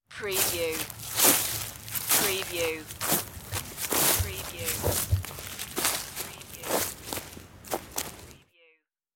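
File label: Rake Leaves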